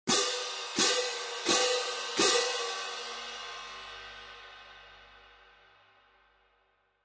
16in Classic Orchestral Selection Medium Light Pair, cymbal sound